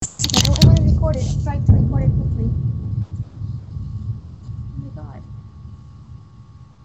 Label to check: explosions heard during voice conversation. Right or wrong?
Right